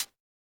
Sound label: hihat, house